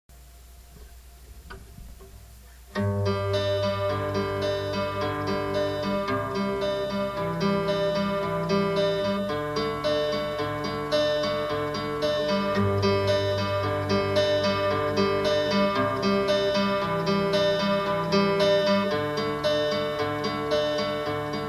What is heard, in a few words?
new song ruff draft with 2 guitars